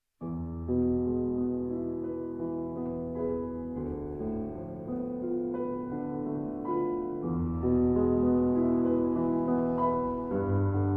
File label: Double Bass & Piano; Beau Soir, L. 6